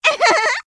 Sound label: giggle; amy rose